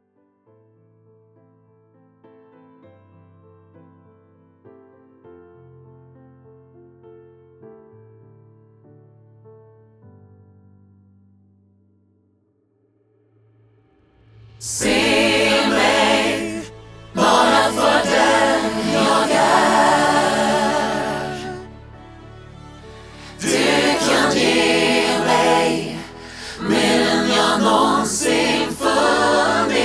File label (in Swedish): allsang instrumental till kören med referenskörer; stambank